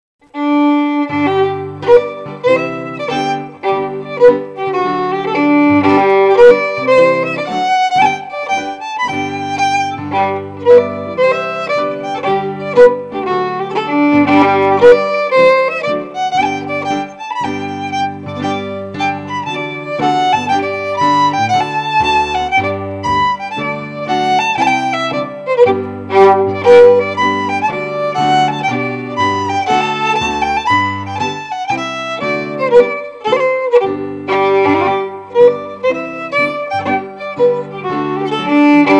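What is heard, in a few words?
Strathspey, Scottish Fiddling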